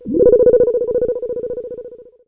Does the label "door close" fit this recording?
No